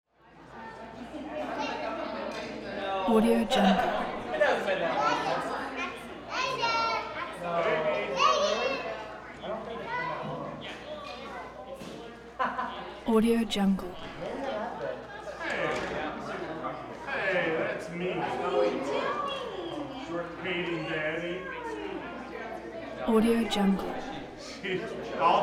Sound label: crowd walla wedding ballroom